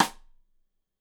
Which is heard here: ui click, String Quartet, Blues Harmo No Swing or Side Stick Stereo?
Side Stick Stereo